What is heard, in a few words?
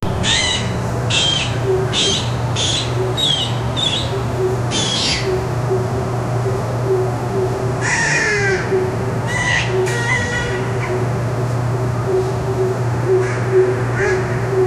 industrial zoo gorilla